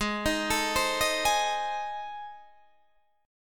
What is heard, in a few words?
A Minor Major 7th Flat 5th